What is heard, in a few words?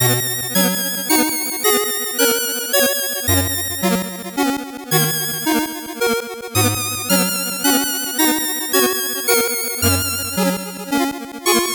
8bit bgm normal